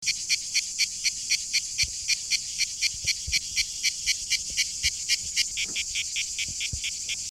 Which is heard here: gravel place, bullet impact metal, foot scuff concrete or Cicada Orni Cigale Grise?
Cicada Orni Cigale Grise